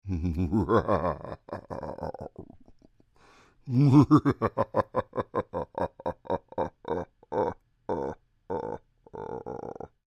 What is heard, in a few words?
Creature, Human, Devilish Laugh; Evil Laughs; Horror